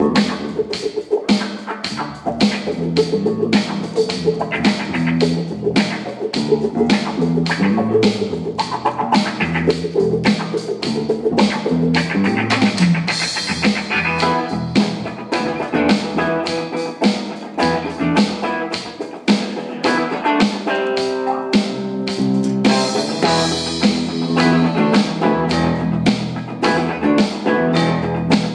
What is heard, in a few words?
intro music